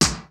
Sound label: kicks snare, kid; Kid Nepro; Elite; KICKS SNARES